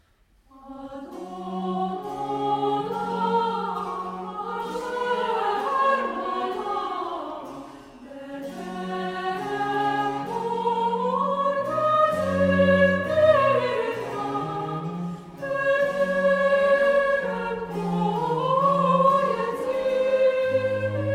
symphony overture in c, synagogal music in the baroque; adon olam; S. H. Jarach